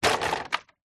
transport bicycle drop impact on wheels

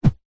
metal crowbar swing in air whoosh; zapsplat

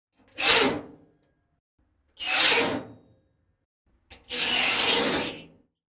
Just Metal Squeaks and Moans; soundbits

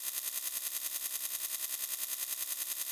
Processing Loop; soundbits

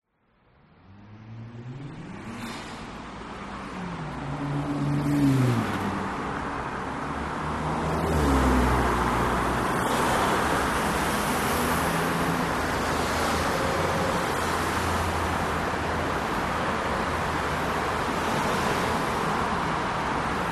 traffic Munich City Germany

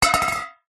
bottle metal drink empty knock over surface; food drink; zapsplat

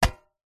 foley tin metal cylinder lid on catch; zapsplat

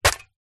industrial shovel spade dig ground single

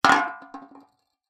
foley can beer empty down table wobble; zapsplat

